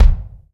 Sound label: raw BD, Drums Acoustic; USB Soundscan vol.10; AKAI; 90s sample CD; ALL BD